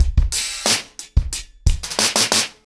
90BPM, ACOUSTIC DRUMS

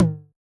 Tom, Yamaha DJX 2B

